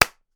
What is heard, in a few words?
Clapping Hands Slap Single Clap Close Up; 96khz Mono; Zoomh4n; Nt5